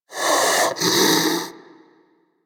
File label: Armadillo Vocal Breathe; Warframe; Hunting Critters